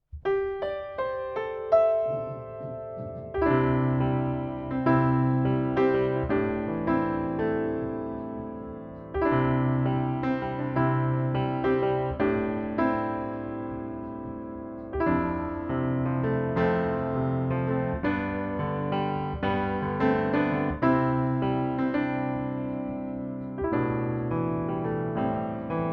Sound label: Piano Ribbon